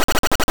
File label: Poisoned